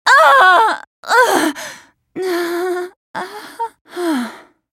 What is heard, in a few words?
dying; AhriSkin04